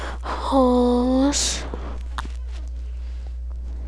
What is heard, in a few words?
hose